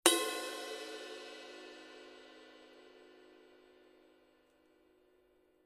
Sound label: giant beat 20 multi bell, paiste